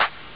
heavy clap; ST-XX Amiga Soundtracker Sample Packs

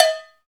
CowBells

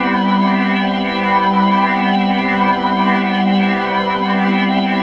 Org Pad; Keys; Pads; Drum & Bass

